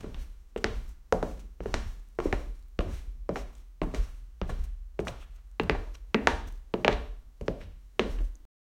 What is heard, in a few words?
walking on a wooden floor